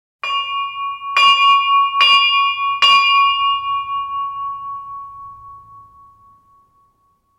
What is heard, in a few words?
Handbell Small Handbell; Churches Bells & Clocks; Hollywood Edge Historical Series